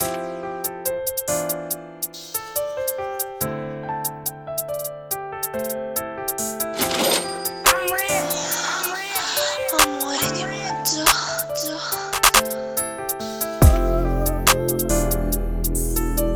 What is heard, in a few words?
141 BPM, free beats